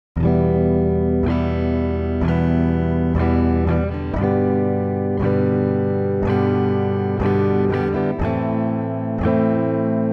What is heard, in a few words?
shred, guitar